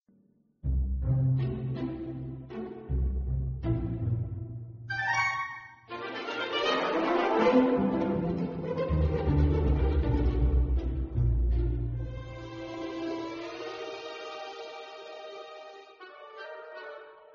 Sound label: music menu; SWR chopper chase; initial music